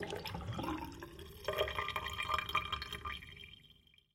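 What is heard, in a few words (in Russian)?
звуки кальяна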